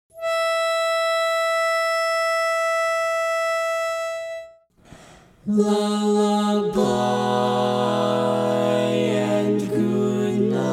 (Female) Bass, Barbershop Tags

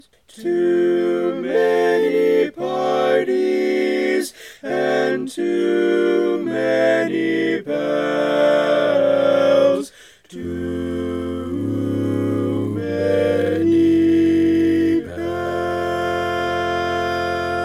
Tenor, barbershop

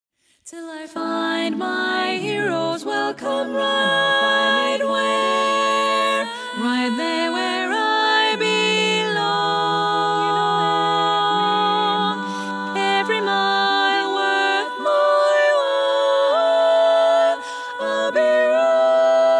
Tenor, barbershop tag

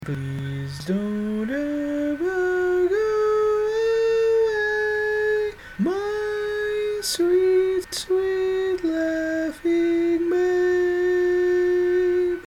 Laughing Babe Tenor, barbershop tag

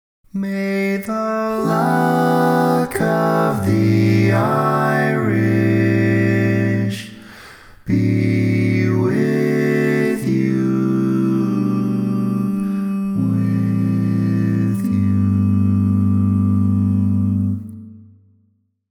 barbershop tags, all parts

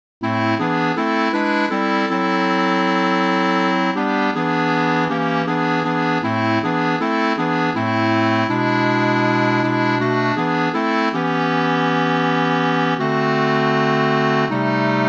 Bari, barbershop tags

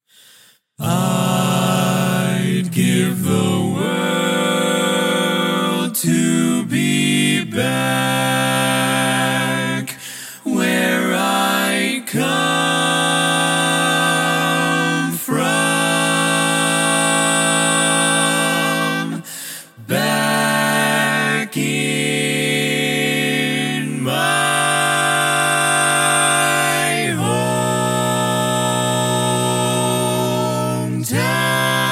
All Parts, barbershop tags

